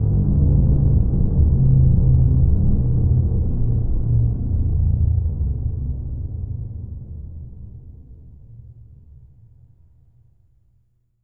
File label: SYNTH BELLS; Zero G; Malice In Wonderland